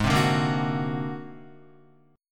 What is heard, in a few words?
Ab 9th Flat 5th Ab down Guitar; Ab9b5 strummed; JGuitar